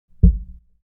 human body heart beat single hard; smartsoundfx

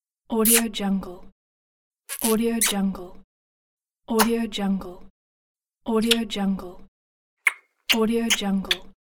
cute kiss

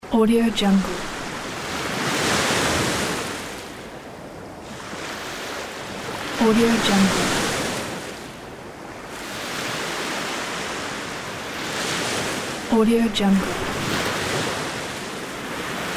waves on the sea

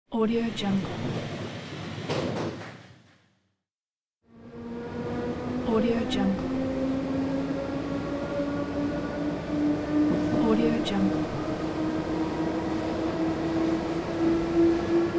inside the train car

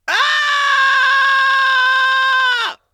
vocal human ai battlecry roar male computer voice

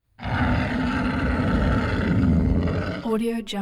thunder with lion roar searing blast growl

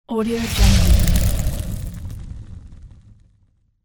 fire whoosh

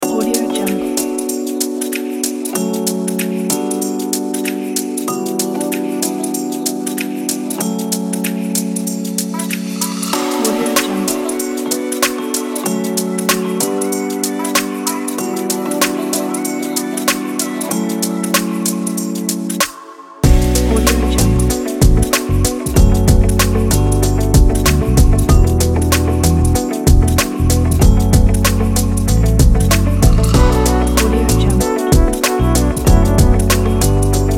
soul rb

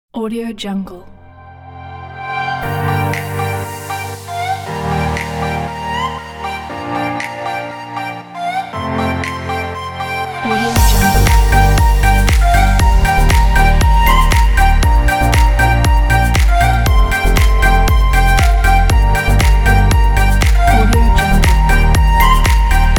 tropical summer

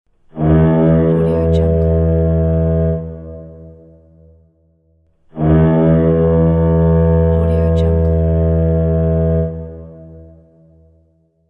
ship horn

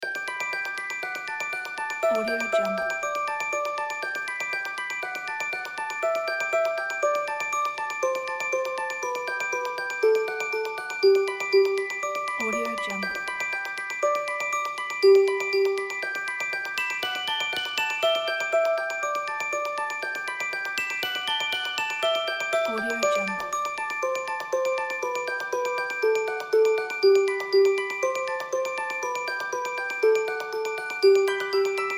harp and glockenspiel

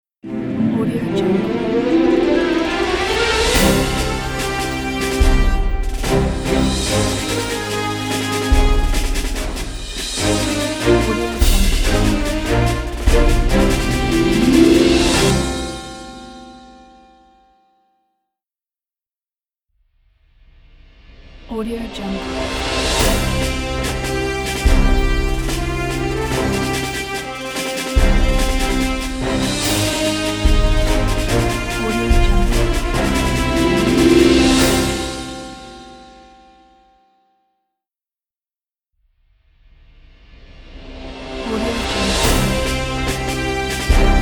cinematic orchestral intro ident

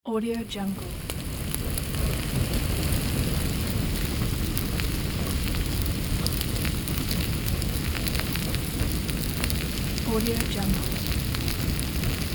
barbecue

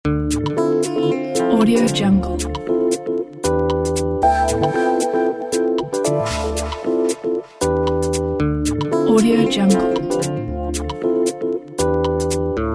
business; business journal; diamondc

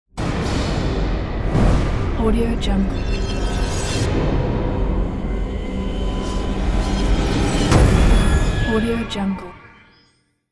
huge gate

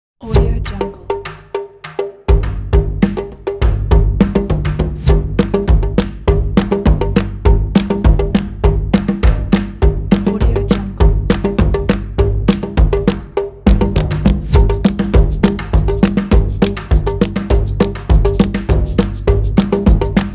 percussion drum line; mrmastermind

